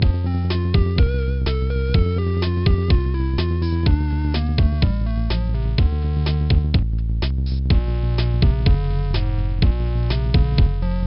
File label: Music Normal; animal world big cats dinosaurs 2009 nds gamerip; Pacman